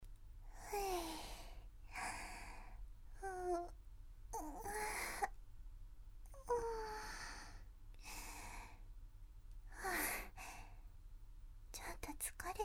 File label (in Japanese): 耳のオイルマッサージ(右耳・左耳), バイノーラル ASMR; 大好きな君; 耳かき・息吹きかけetc